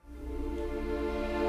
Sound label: dialog mysterious intro